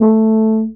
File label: TUBA STRA A2, Tuba Straight; Orchestral; DirectWave; Varazdin Orchestral